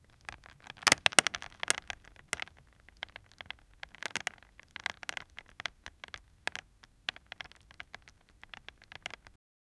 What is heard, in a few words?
accident sound